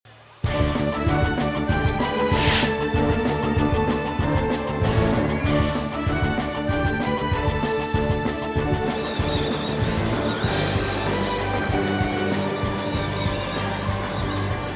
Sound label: instrumental; mlp ost